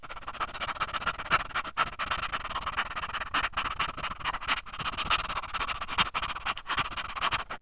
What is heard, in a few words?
dog pant; animals; Wired for Sound Pro CD; Aristosoft, Inc.